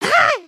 gasp; emotes

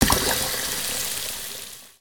Water Fountain

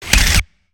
Robot Move Arm Up; creatures mechanical; Lego

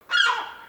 Seagull Call; creatures organic

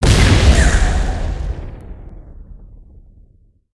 Spider Boss Fire Rain Impact

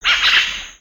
Bird Amazon, ambience avant gardens; Lego

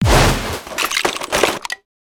Metallic; destructibles

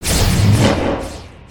scratch

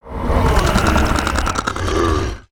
punce; creatures organic

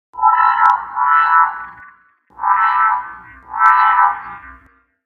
Robot Idle Scan; creatures mechanical; Lego

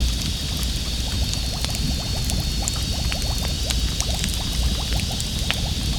Amb Courtyard; LU; ambience ninjago

